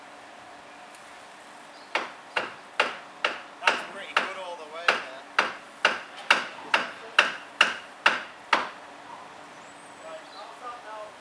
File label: Building Work 96khz